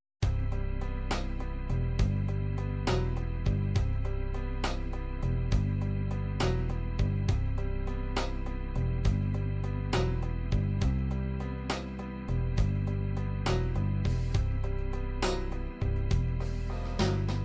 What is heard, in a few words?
6 8 blues, midi